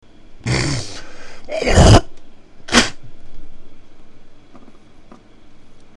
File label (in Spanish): escup; denuncias; escupir